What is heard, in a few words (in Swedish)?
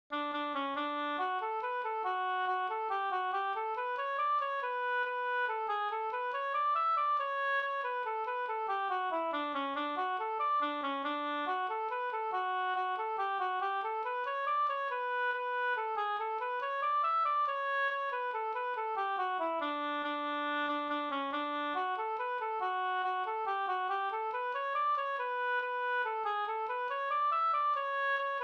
mazurka; e Nils Gustav Larsson Grinda Sd Flen276